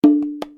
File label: bongos